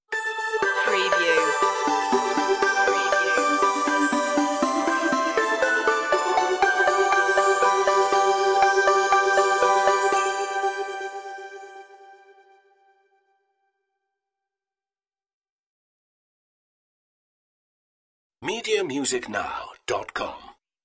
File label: merry christmas synthified stinger outro